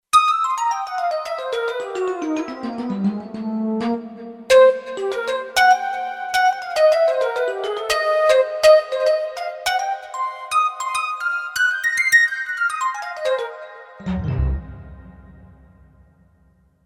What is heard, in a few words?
Kurzweil K2500X Chiffer Lead